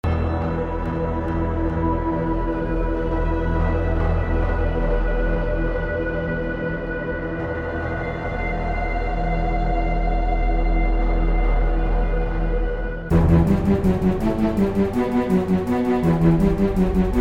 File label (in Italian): musica trailer eco